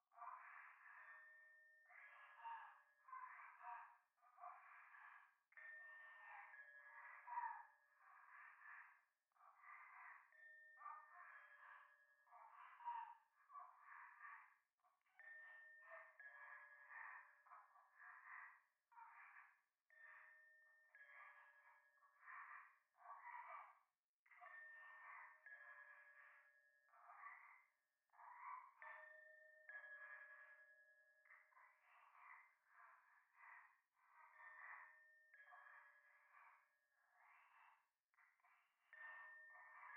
右耳 密着 処女 喘ぎ 神社; 夢の中

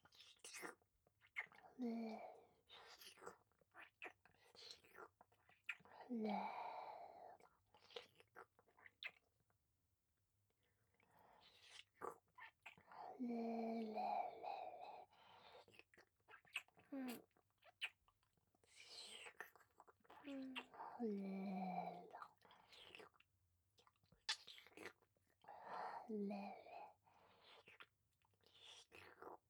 乳首 舐め 左

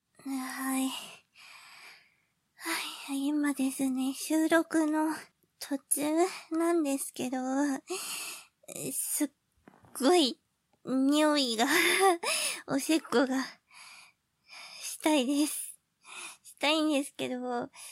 紙オムツを履いて オシッコです; 収録途中に！

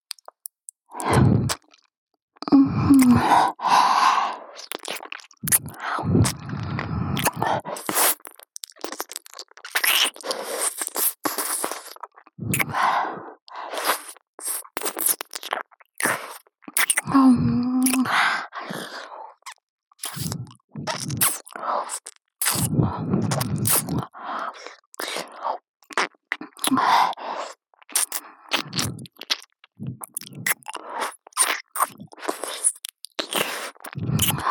白衣の天使の右耳舐め, ASMR; SE有り

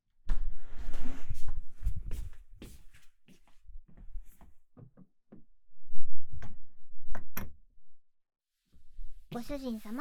竹の耳かき, ASMR

